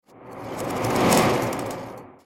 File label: Scary Whoosh With Flutter; SoundsCrate